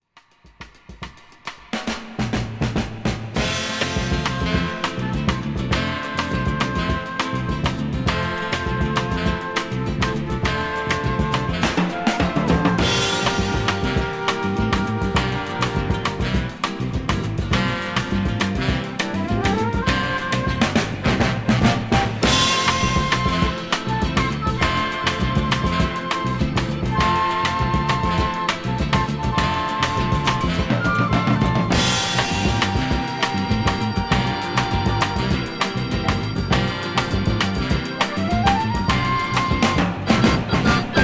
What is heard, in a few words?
soul drums bossa nova